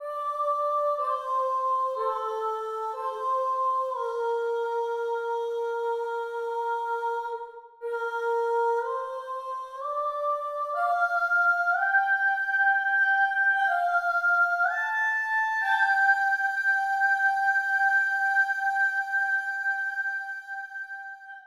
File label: vox bar 123 142 bpm, vocals; terranigma